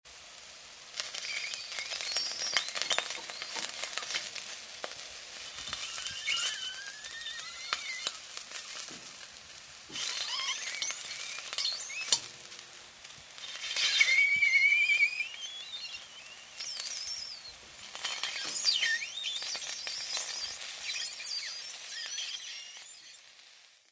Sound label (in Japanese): konjac cry